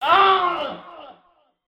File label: scream